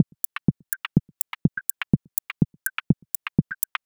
minimal house click loop 124bpm

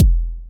hard techno kick one shot

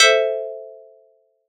glassy plucked synth bright and short key am

